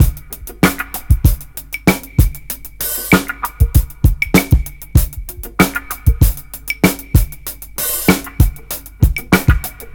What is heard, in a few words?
17 R&B 96.5 bpm, Loops Breaks; POTBreaks